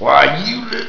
pain; quake2; dung

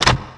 lever; quake2; inland

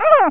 pain; quake2

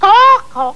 death; quake2; penguin

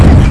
bump; quake2; devastator

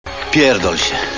pain; quake2

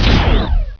pain; quake2; skydive

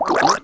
gurp; quake2; zumlin

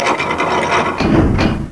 door slam; quake2

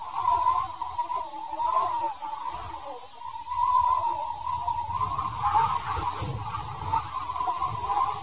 wind; quake2

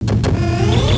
fall; quake2; attic

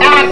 fall; quake2; martian

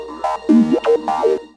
screech; quake2; chaos

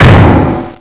jump; quake2; andross cube

